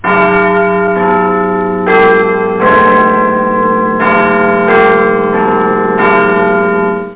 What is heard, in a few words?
Big Ben; DClock